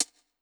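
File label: closed hi hat, 135 BPM; Ueberschall; Drum & Bass Resonance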